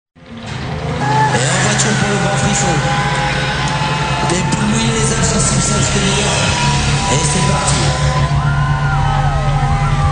Fete foraine; bruitage

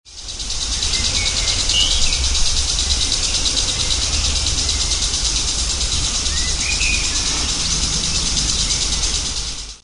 Arrosage jardin; bruitage; Liquides

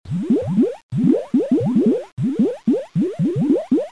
Bruit d'eau; bruitage; Liquides

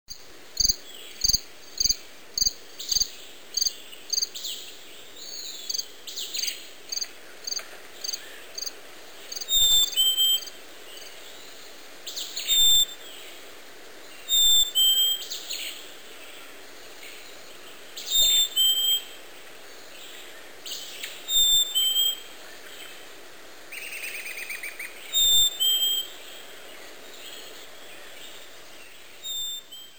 Ambiance jungle

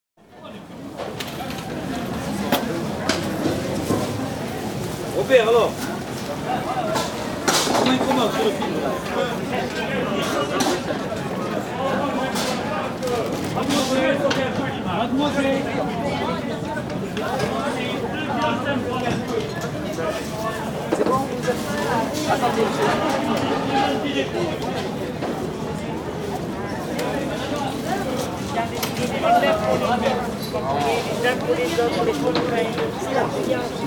Marché plein air, Ambiances; bruitage

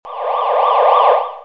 Police, sirene

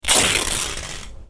Pantalon qui se dechire

